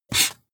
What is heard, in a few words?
marker mark hard short